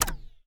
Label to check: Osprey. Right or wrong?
Wrong